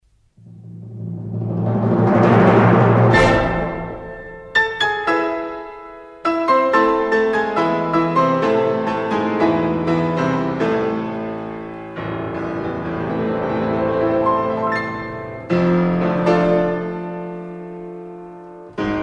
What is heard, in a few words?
Piano Concerto in A minor; Grieg; Op 16